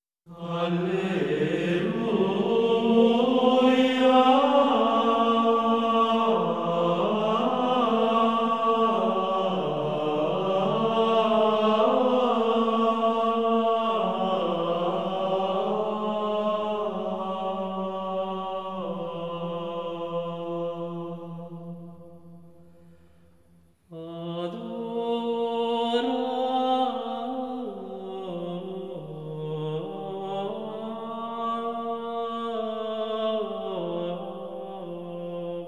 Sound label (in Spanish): Canto gregoriano